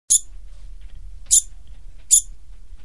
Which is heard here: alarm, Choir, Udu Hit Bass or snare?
alarm